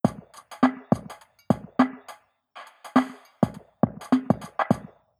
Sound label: V shape drum